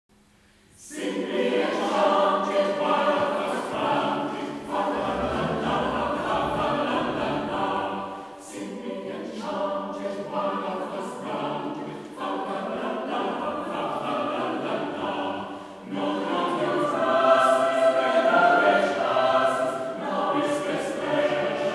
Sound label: Sing we an chant it